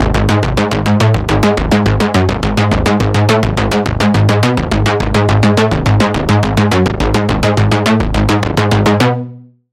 Bass - Dark Wave; Routed Sound; Vital Expansion - Doom Carpenter Vol.1

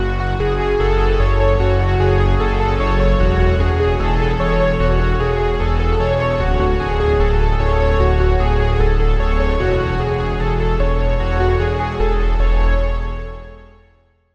Key

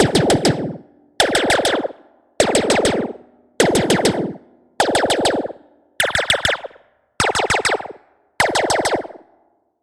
Micro Gun; SFX; Routed Sound; Spire Expansion; Hyper Gamer Vol.1

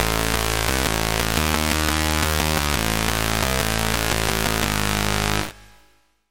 Lead, Sylenth1; Routed Sound; Sylenth1 Expansion - Chaos Bringer Vol.1

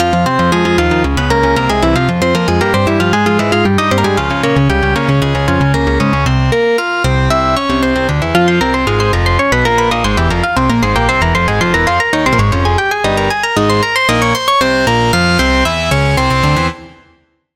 Key; let us play; Routed Sound; Sylenth1 Expansion - Hyper Gamer Vol.1

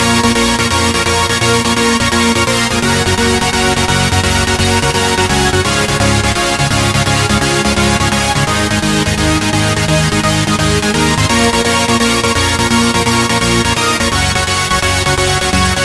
Chord, Sylenth1; Chord - Magneto Hydro; Routed Sound; Sylenth1 Expansion - Star Scavenger Vol.1

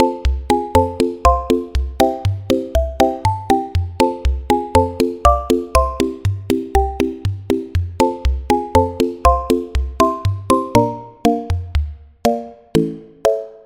Pluck, Sylenth1; Routed Sound; Sylenth1 Expansion - Story Walker Vol.1

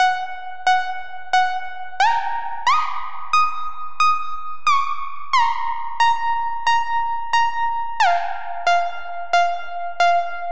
Lead, Sylenth1; Lead - Faded Echo; Routed Sound; Sylenth1 Expansion - Star Scavenger Vol.1

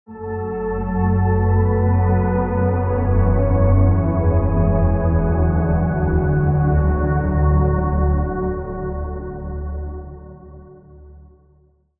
Pad; Data Era; Routed Sound; Vital Expansion - Reality Maker Vol.1

